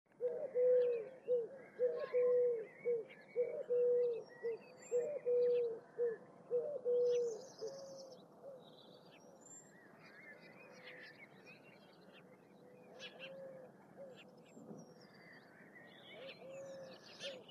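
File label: turtle dove on the street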